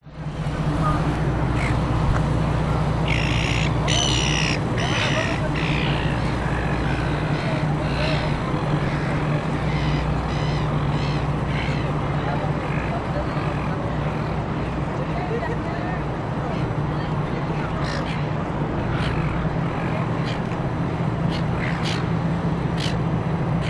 public places city darling harbour sydney distant city hum pedestrians seagulls; australia